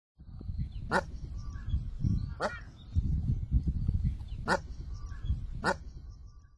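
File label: Brant Goose; コクガン; 兵庫県昆陽池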